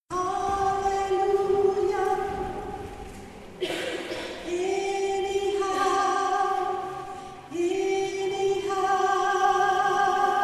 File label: SOPRANO